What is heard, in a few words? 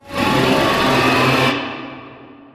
Assembly Floor Open; Boomtown; Titanfall 2